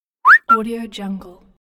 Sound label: human whistle